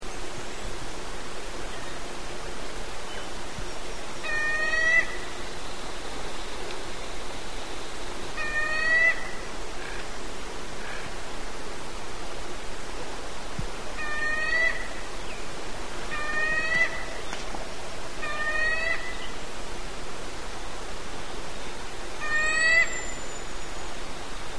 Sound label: hooded mountain toucan